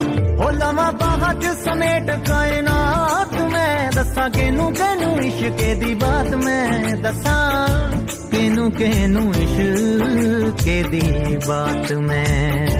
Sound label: punjabi love ringtone